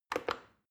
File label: plastic button start click regular fast